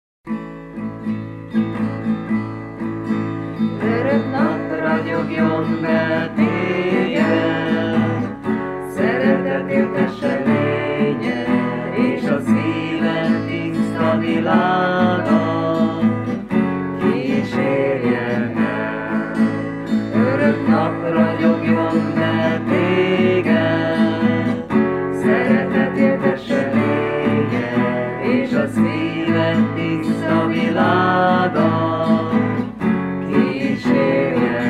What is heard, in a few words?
strummed reverb eq normal